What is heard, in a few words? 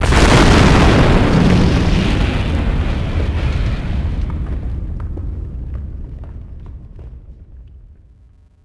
explo Bridge; impacts explos; theed